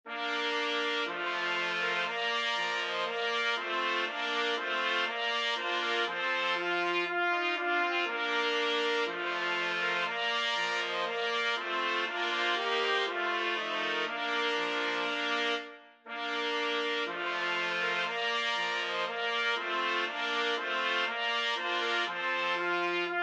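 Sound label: trumpet voluntary, 4 trumpets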